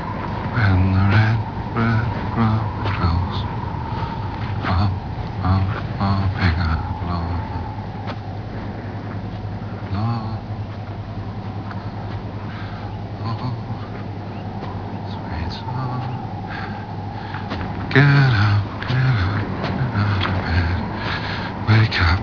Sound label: singing